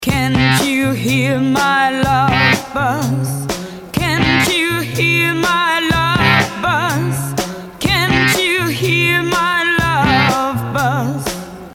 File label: Vocals ('can't you hear my love buzz') & instrumental